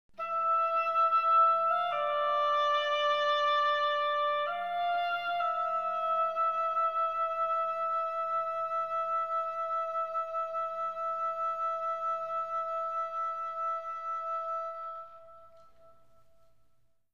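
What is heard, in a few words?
theme melody; Myst III Exile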